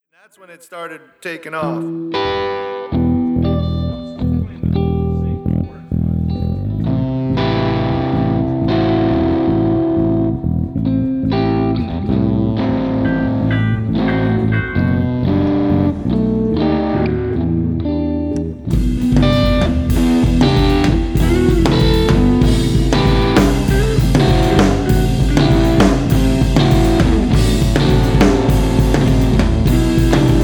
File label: Hollow Sound Solo; Practice